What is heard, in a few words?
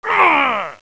die, player; bts rc; construction